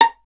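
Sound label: STR violin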